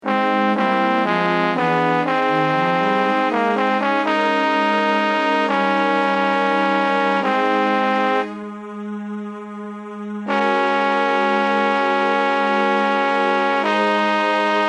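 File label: Baritone, barbershop tags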